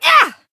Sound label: Voice attack